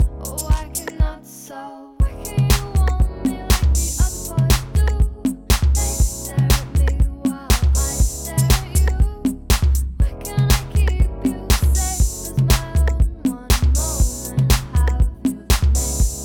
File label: drum